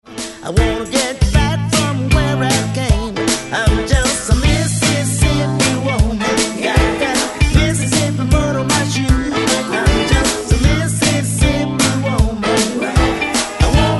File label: Soul Blues and Delta Blues Mash Up